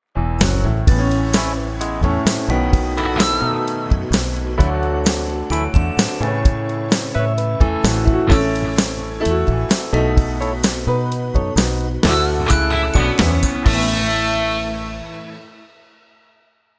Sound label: LONG Jingle instrumental; Chantel Ray